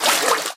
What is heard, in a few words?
water wade, footsteps